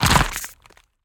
creature crab organic texture carapace crack squishy; bluezone; sonniss gdc